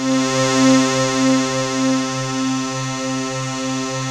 NOIZ SYN; Masterbits; Vintage Voltage; SEQ-FX

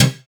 Synths Root C

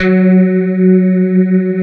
STRIA, Twisted Textures; STRYNGI

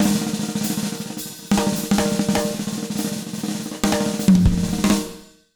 100 B BEAT, Jazz Latin Drumloops

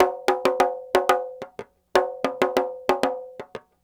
Petia, World Percussion

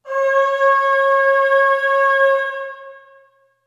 Aah Short C#4 Short Reverb; Harmonic Building Blocks

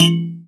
KALIMBA; Spectrasonics; Heart of Africa; KALIMBAS

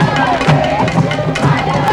Voodoo Snippit, Percussion Loops

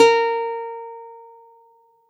laute big; SoundBytes; Early Patches

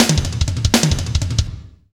Fast Shuff, Drumscapes Roland; Northstar

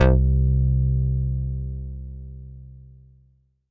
Pulseboy c3, Korg Poly-800

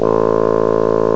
BASSOON, REEDS; CMI Sound Library Rev 1.3

